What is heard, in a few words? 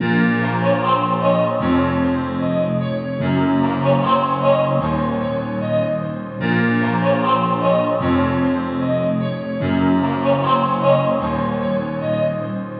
150 piano vox A-m; knights